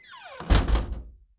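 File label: salon close; Mafie 1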